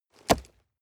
nylon leather hit hard dry

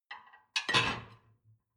dishwasher plate place

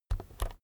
keyboard external key press slow soft

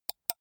computer mouse left click single

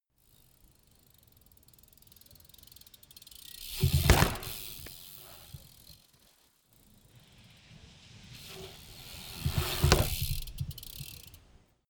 bmx maneuver ramp in and out

